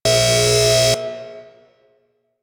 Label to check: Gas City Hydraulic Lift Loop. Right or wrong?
Right